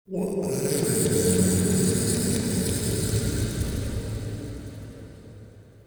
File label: Interior Texture Gurgle; Ambience; Indoor; Warframe; Infested Eris